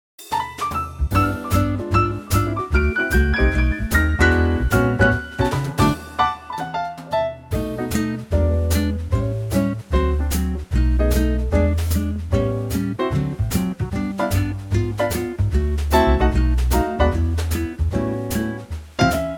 D to Eb, Backing Tracks for Male Vocals

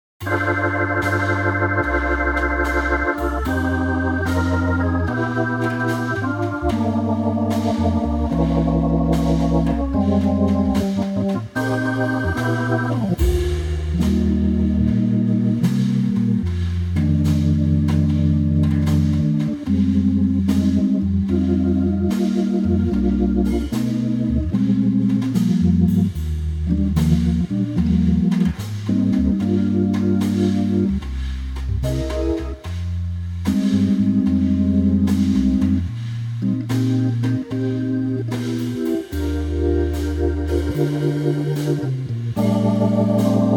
Eb organ, Backing Track; Jazzville; key - Eb - vocal range - Bb to D; Wonderful Hammond organ and brushes arrangement of this incredible standard

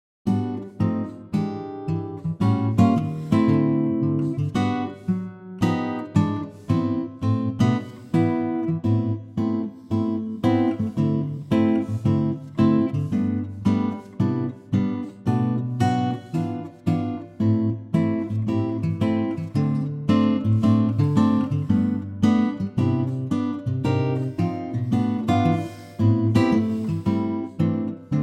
G Ac Gtr, male; Acoustic Guitar; vocal range - G to C#; Stunning acoustic guitar arrangement of the jazz standard; Suit lower male vocal ranges

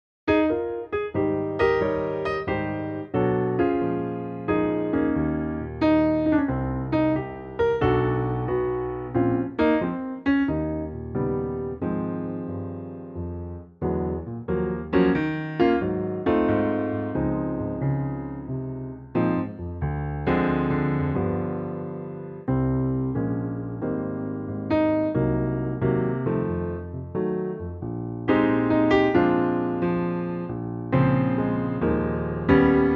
Ab piano; key - Ab - vocal range - C to C; Lovely piano only arrangement of this great old standard, at a great tempo and feel.

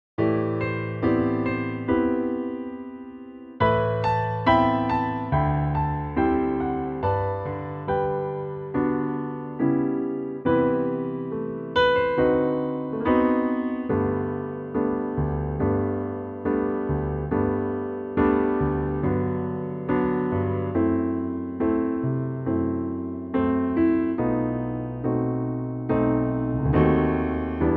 G to Ab piano; key - G to Ab - vocal range - E to F

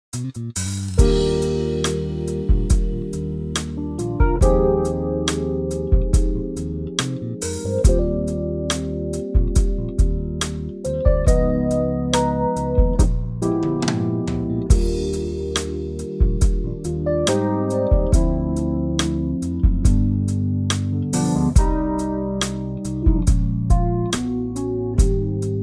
smooth Trio Eb

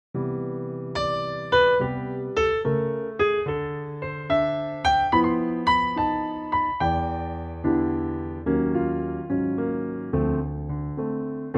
C piano; key - C - vocal range - C to F; Very lovely piano only arrangement of the classic American standard

